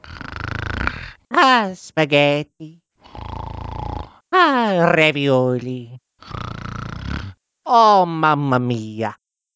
snoring; sm64coopdx; sfx custom luigi

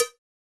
cowbell; Flipper Flop; attention

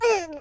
ooof; yoshi; sm64coopdx; char-select-extra-chars

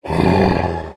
zombie random; Mineclonia

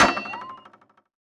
long jump; HeavenStudioPlus; see Saw

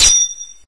somen catch old; charging Chicken

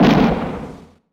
explode rocket; HeavenStudioPlus; fireworks

